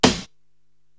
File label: SD Dark Vintage, Korg TR-Rack drum machine